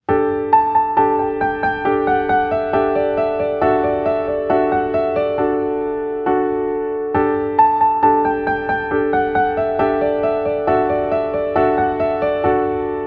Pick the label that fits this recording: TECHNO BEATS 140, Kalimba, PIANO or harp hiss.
PIANO